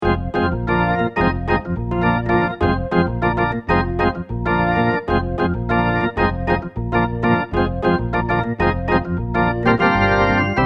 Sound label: Kurzweil SP7 Fast Rotary